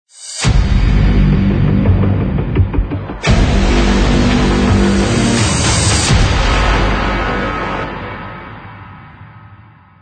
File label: Action Trailer Intro